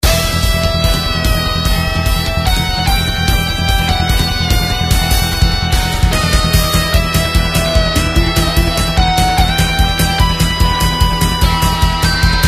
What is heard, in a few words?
bgm; MapleShrine